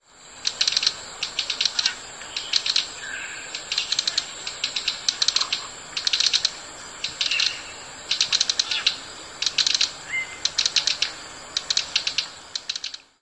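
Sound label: Great Kiskadee call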